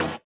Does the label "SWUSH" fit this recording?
Yes